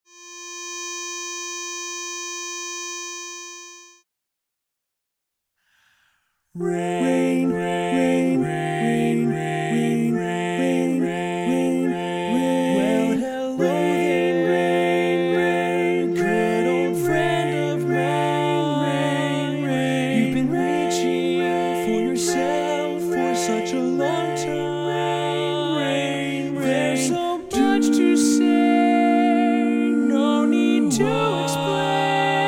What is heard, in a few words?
baritone left, chorus